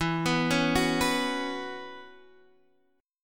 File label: B Suspended 2nd Suspended 4th E, chord